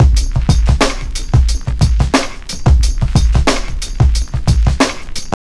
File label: TRIP 90, trip loop